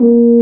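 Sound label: brass, F.Horn Swell; Roland L-CDX-03 Disk 2; French Horn; F.Horn 4 Sh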